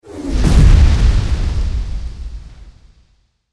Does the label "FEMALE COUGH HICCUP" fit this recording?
No